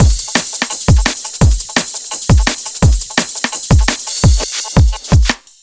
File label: Freaky Beat, 170 bpm